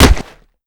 long punch; Modern Warfare

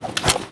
pickup ammo

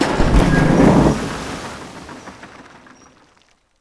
Work Boat Mod Work Begin; Civ4 warlords

